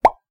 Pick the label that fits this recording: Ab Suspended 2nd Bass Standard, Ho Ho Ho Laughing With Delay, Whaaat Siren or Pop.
Pop